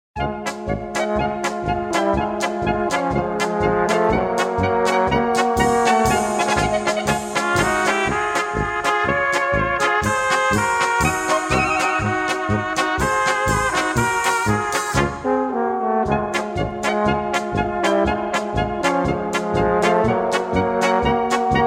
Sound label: instrumental